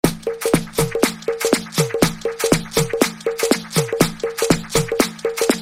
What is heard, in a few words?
Drum Distortion Afro Drum